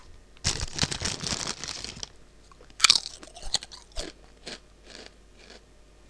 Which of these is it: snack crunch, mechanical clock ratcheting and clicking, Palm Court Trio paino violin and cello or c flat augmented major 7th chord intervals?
snack crunch